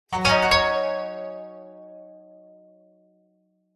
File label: multimedia game sound japanese plucked positive tone musical